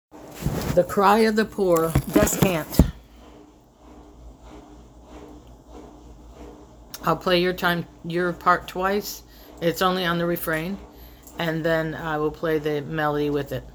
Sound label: descant